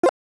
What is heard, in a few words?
Menu Pause